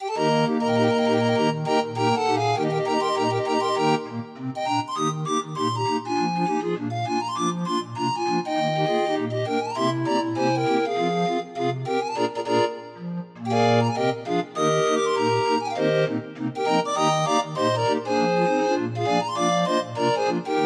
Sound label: 31 note, Grind Organ